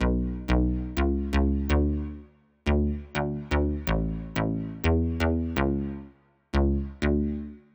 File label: Bassline B 124 Wet